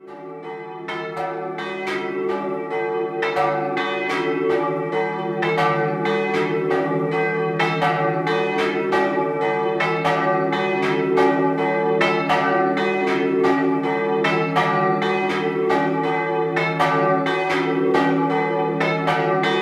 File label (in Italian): Doppio, campane; Prossenicco